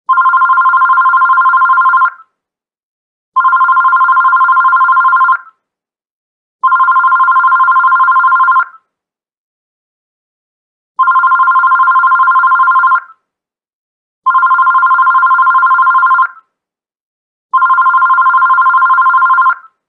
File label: office phone ringtone old phone ringtones